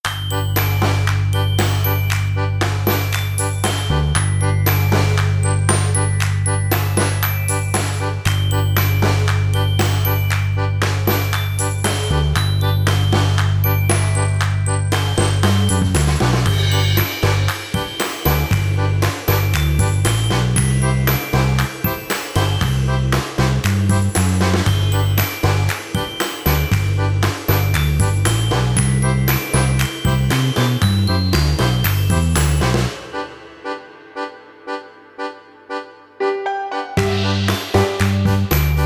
Free BGM that suits tropical beaches Cute band music